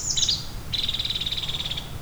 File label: Nuttall's Woodpecker; Park; Andrea Cornelius